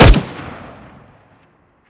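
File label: m4a1 unsilenced, weapons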